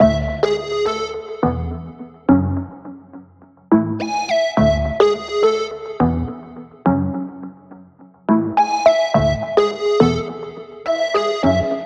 songstarter 105 F#m, Pop; Slate; SD PASADENA Pop Sample Pack

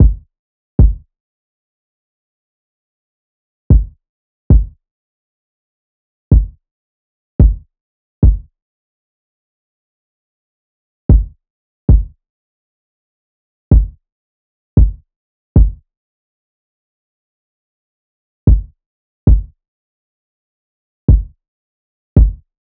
kick 65, Kick Loops; drum sample pack; Iliniza; SEISMIC; Slate

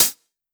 Open Hat, 909; Slate; Vintage Drum Machines Volume 3 909 Edition

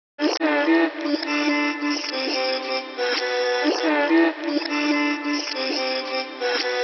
Swirls vocal chop, 140, E; Slate Digital Omnivox Vocal Sample Pack; Chops

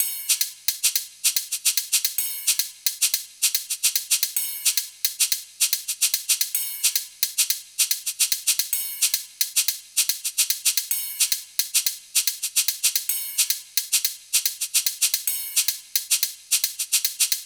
hat 110, Drum Loops, Hat Loops; SD MIDNIGHT Sample Pack; Slate

